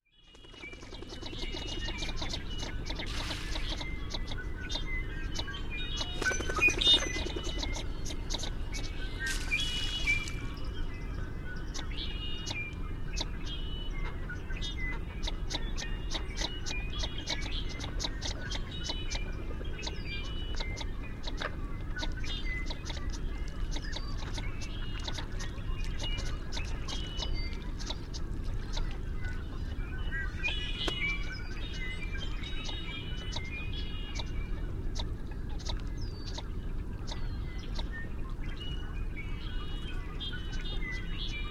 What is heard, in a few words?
Shovelers